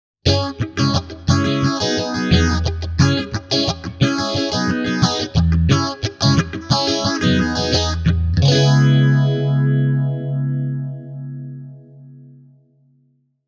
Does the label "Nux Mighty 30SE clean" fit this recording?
Yes